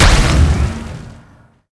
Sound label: click endless